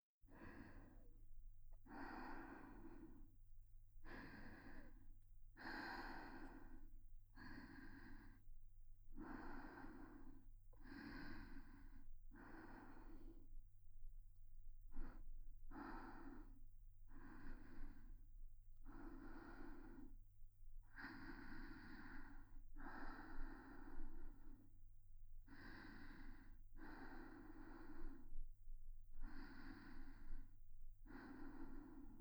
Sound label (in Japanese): 安眠用のお姉さんとの添い寝＆寝息オンリートラック, ASMR; 密着添い寝